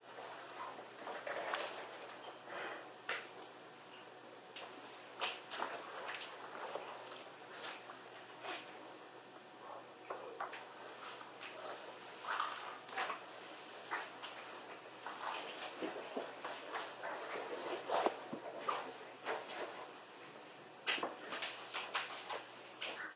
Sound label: class room quiet